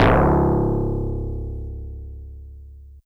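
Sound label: Easy Clav DX100 C#3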